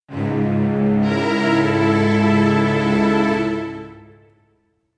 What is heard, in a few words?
strings